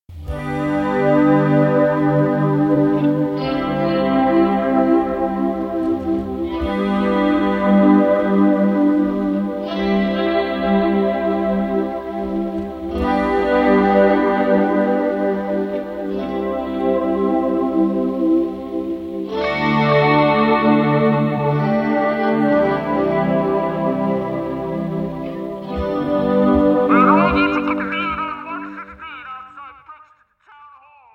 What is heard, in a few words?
Guitar Chord Swells